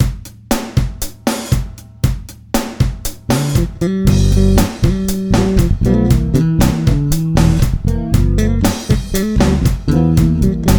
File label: FUNK ALL SPREAD, bass; suhr classic j oly white